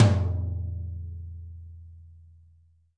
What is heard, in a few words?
thin tom, Multisampled Real Kit